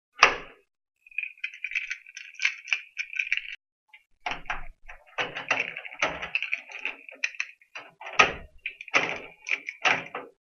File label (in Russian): zvuk otkryvanija dveri